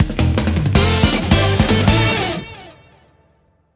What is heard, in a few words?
JINGLE CLEAR; the magic nightmare; android ios mobile gamerip; WHITE JINGLE